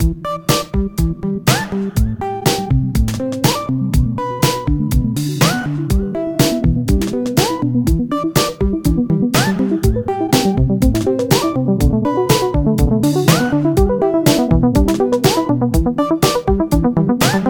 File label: BGM Child; life is a game android